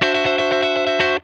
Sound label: D JANGLE, Funk Guitar; Zero G - Funk Guitar